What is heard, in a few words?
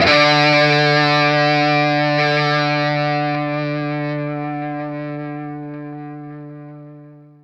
SINGLE D, Vintage Blues Guitar, ELEC.GTR; AKAI; USB Soundscan vol.22